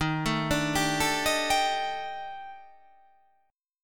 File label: D sharp Major 7th Flat 5th